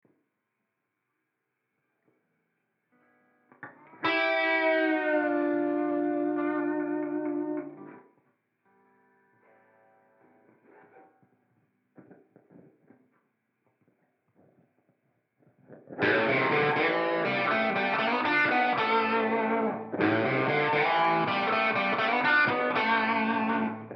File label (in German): mesa triple rectifer plus harmonizer mit tape delay; ik multimedia amplitube 4